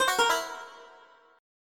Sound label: scifi